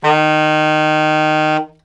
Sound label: Sax ff Eb3; dsp2008 sound library